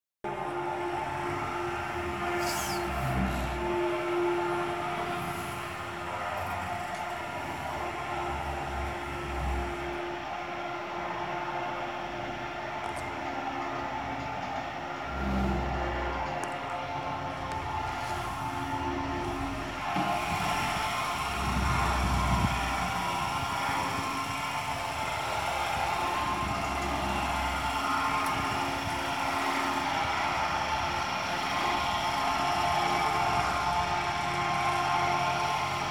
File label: Handicap Lift Sound; ADM